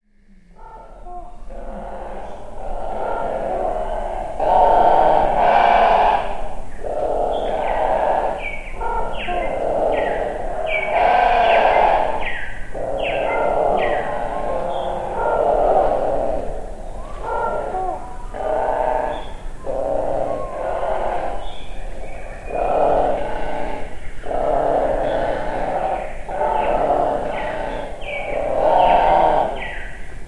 Rooks, Rookery; Birds; Animals; Tom Hutchings

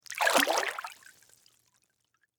Water Splash Bath Tub Single Movement; Bathroom; Household

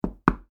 Foley Door Knock Door Twice

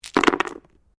Dice Small Game Board Throw; Variety of dice sounds; Casinos

